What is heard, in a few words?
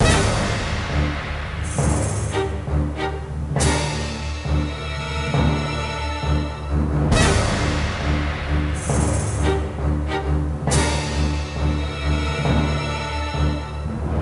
Wizard Battle; Lego Battles 2009 NDS